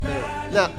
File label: VOX Back